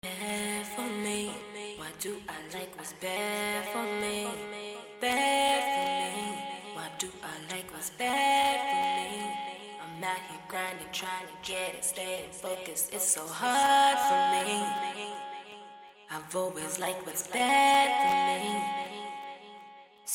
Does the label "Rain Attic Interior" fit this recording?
No